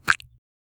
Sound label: short eating sound soft a, chewing